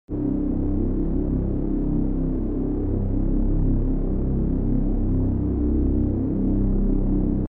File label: cinematic background